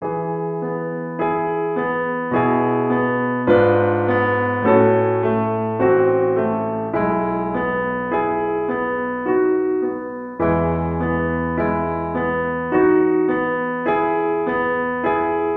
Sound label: Arpeggione Sonata; Schubert; 第2楽章